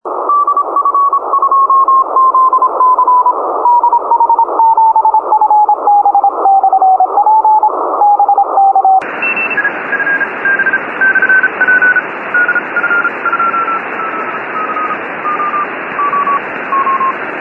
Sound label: iss bounce